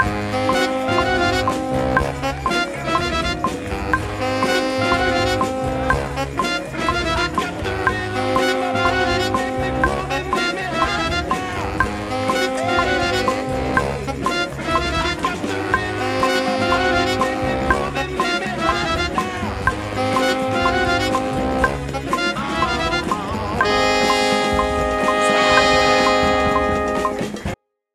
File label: RYTHM; TUSSENTUNE